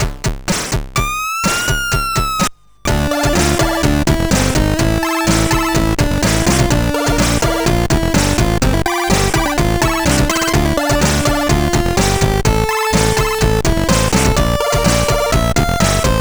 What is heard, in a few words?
Chiptune, sid CSG8580R5